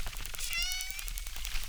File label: Chirp zap, acquarium